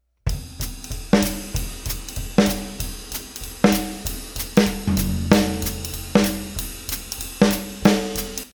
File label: Swing; Zen Art of Drumming samples; rudiment